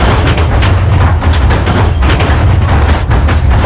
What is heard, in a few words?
roughness medium tank; World of Tanks Blitz